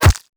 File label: character hit; Max Payne